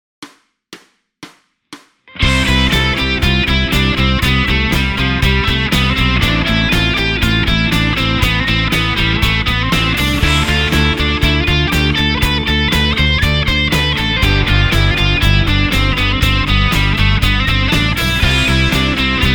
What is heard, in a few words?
adrib bad